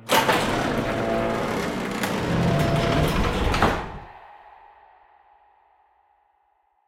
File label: Garage Open; silent hill homecoming 2008 gamerip pc ps3 x360